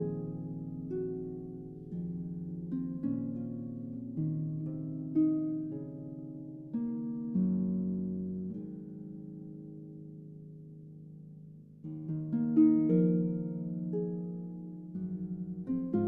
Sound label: Harp Concerto Lullaby